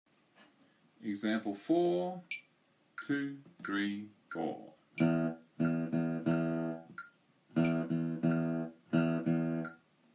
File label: Rhythm; Grade 2